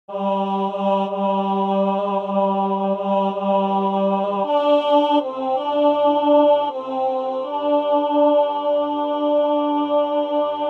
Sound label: Tenor